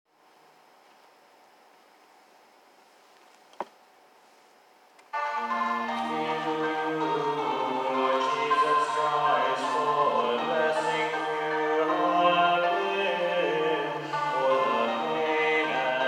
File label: bass with midi